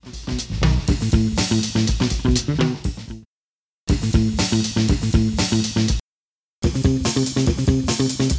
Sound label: Drums & bass